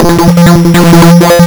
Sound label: lsdj waves; wavetables